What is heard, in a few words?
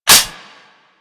Magnum Reload Hit, Weapons; Warframe; Tenno